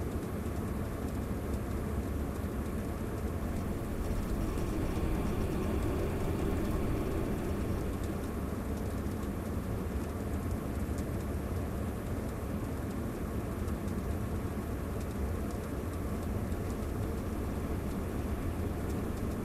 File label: Ventilation; MiSide